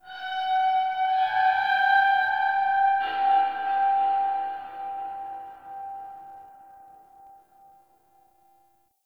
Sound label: Distant Metallic Groan; Warframe; Tau